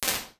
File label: Building Site, Impulse Responses; Small Spaces; Indoor Spaces; Apple